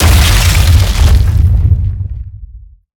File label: Mortar Cannon Impact; Evolve; Behemoth